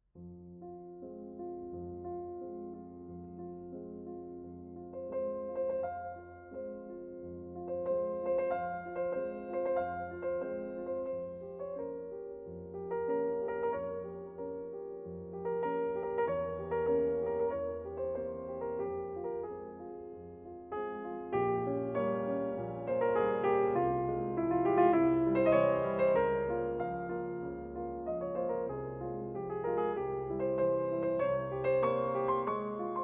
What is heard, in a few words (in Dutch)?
Piano fantasie in F mineur 4 handig; D 940; opus 103